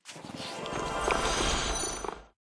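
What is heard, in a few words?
Pet Manul buff; manul lvl 4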